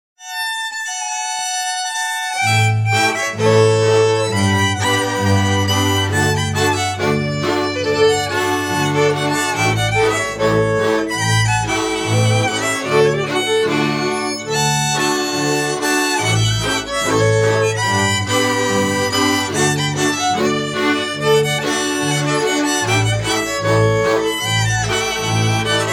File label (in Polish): Krakowiak rzewny